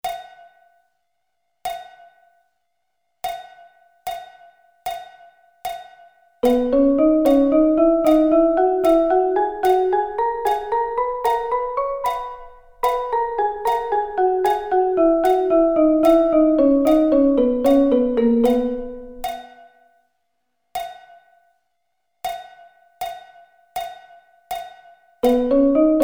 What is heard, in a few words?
B Double Sticking